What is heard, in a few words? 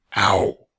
mobs yeti damage; server-nalc